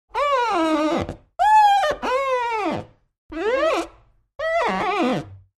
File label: Glass Friction